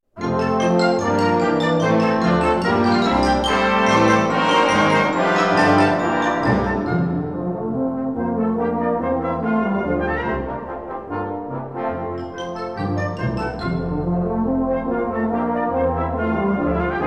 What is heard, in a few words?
shepherds pipe carol